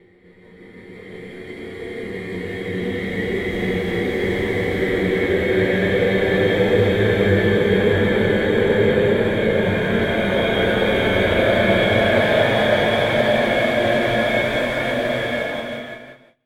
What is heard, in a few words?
Epic Ghost